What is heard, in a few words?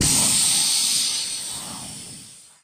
air